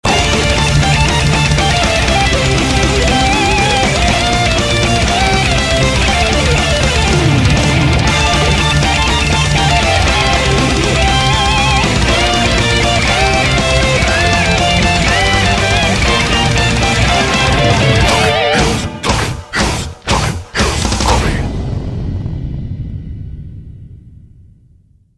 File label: Solo